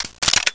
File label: sniper reload second seq